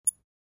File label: timer beep